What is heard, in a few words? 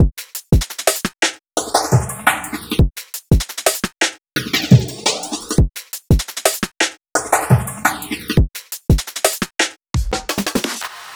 172 artcorish introish drum